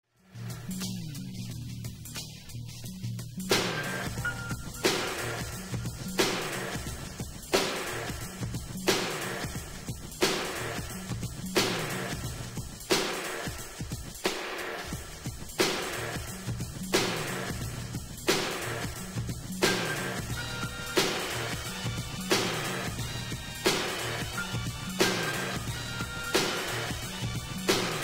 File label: Instrumental